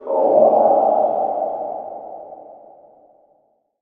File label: abyssal hammerhead idle; Barotraumatic